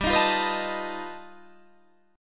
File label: G Augmented 9th, Guitar, Standard; G+9 strummed; JGuitar